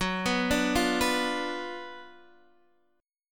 Gb Major 7th Suspended 4th Sharp 5th Gb Guitar Open D